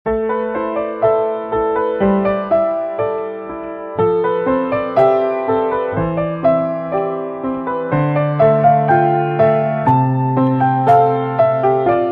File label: theme piano